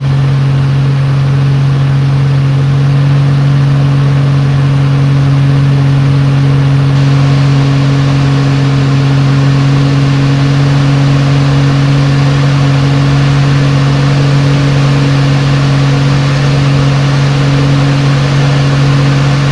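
extra 330lx onboard fly high rpm to back off throttle high medium low rpms engine mix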